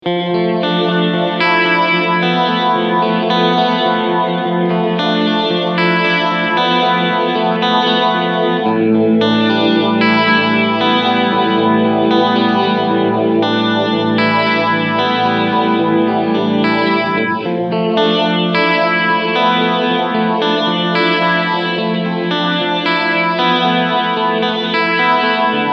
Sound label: phaser